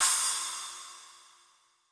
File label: crash